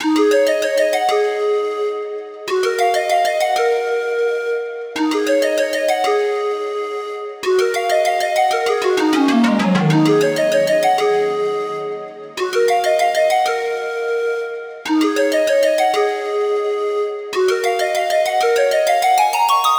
Synth

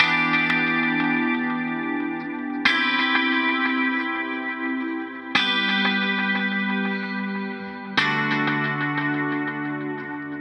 guitar chords Em 90bpm

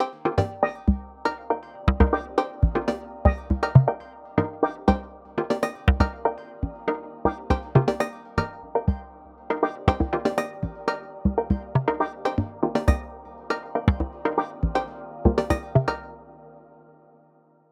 Cm Texture Full, 120

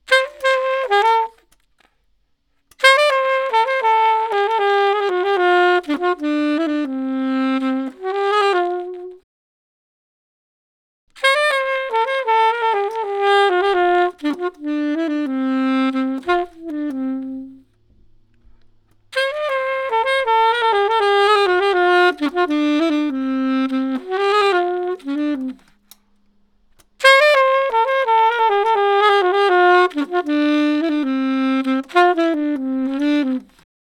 TRANQUILITY BPM 120 D min

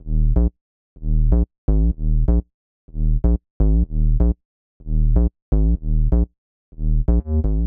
House Bass Loops D 125; Bent

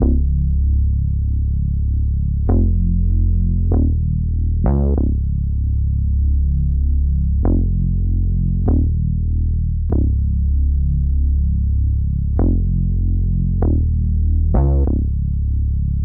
117 Fm synth bass

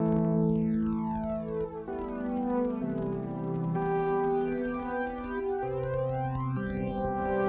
Distorted Synth

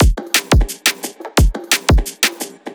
DNB DRUM LOOP 175; KULTURE

